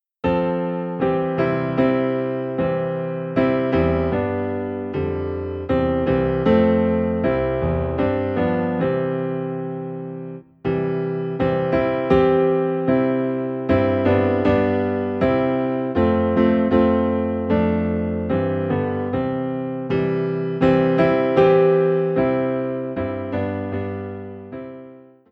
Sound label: Piano, Low in C; The Solid Rock